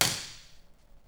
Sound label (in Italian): AULA; IMP-RESP